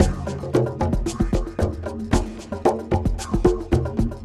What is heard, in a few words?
DJEMBE FRAME MACHINE COMBO; ELECTRO ACOUSTIC HYBRID GROOVE; Sonic Foundry (Sony Creative Software); Vir Unis Infusion